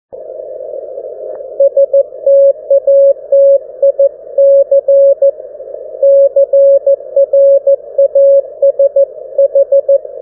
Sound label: 1W QSO, 1W QRP QTX; GW4HXO 1W Mini-QTX... PP3 or external 12V battery